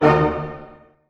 A MIN PP, ORCH HITS, Orchestra; Sound On Sound; Peter Siedleczek's Orchestra

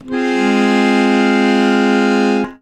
AKORDE F MOL, ACCORD; ACCORD.CHRDS

